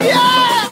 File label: "yeah", sung